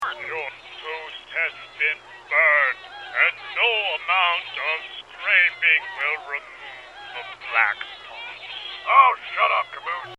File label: toast